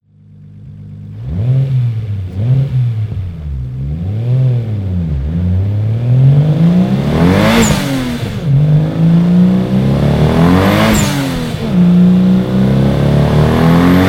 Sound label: Supra freerun; TOY Supra 97; Dynos and Drag